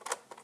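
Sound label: fast forward down; Mass storage; TS2020; zxsp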